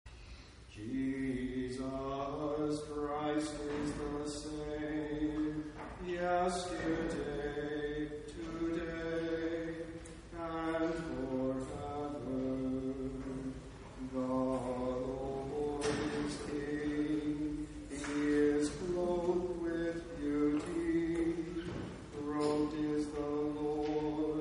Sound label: Communion Antiphon